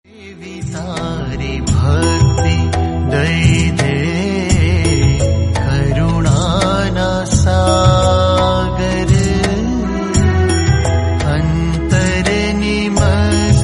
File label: bhakti song